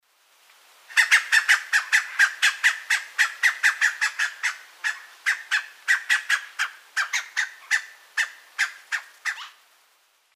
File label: Psarocolius angustifrons, calls; S. America; Eastern Lowlands